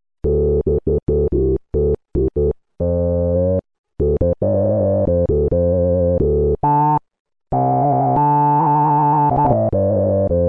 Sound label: Roland Juno-106; Factory Preset; Froggy